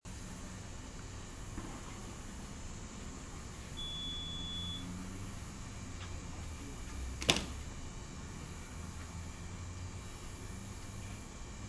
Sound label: cicadas vs fluorescents; outside lincoln ne